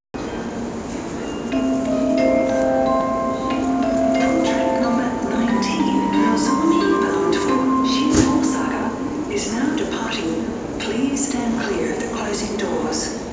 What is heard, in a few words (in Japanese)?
のぞみチャイム, 発車メロディー